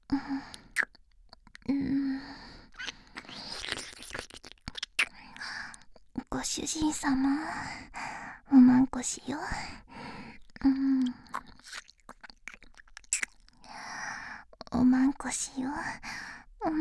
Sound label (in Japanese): 耳舐め; おま○こしよ♪